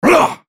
effort; volibear

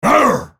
spellcast effort; Volibear

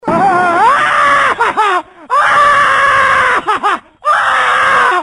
aahh

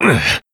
pain; mccree

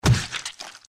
flesh; hl2dm; oppressive territory

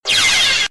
hero death; tf; danmaku fortress

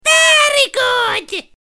jump; freak fortress 2; cream

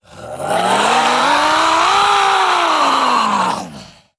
chase; slender

